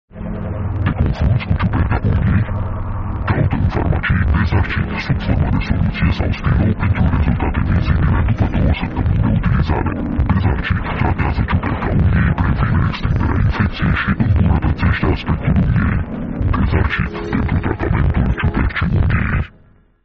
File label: scary